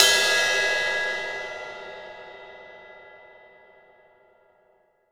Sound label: Ride Cymbal; Essential Percussion